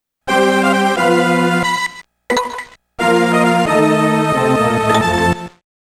GAMEOVER (Game Over)

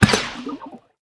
Bird Enter Water

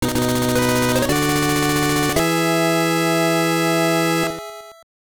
Jingle; super street basketball 2; sgb

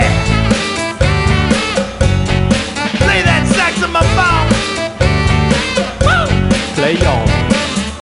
Cowbell; Happy Feet Two; NDS

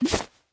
Ui Changing Outfit

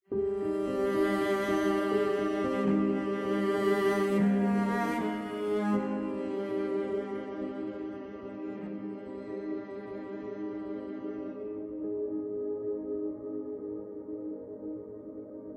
Melody; Outro Grey Interior Second

